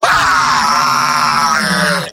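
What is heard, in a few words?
demoman pain critical death; tf2; garrysmod; mvm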